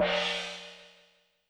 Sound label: Gong; YAMAHA RM1X